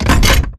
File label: Metal Jog; Titanfall 2